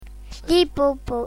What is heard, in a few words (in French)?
hippopotame, comptines